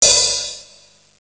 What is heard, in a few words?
cymbal; FISHWISH